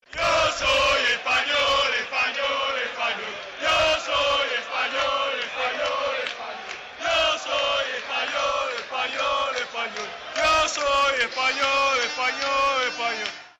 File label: spanish football chant yo soy espanol